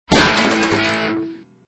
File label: Guitar Impact